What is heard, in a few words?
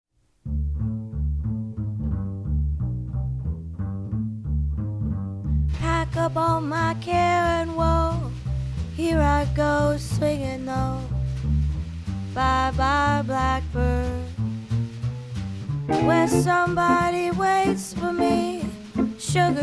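Oktava ML16 Ribbon mic Jazz; Ribone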